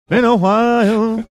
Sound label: singing been a while